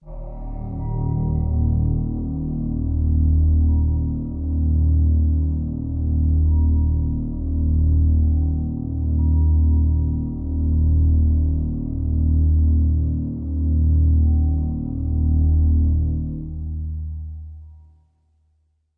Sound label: space beast, pads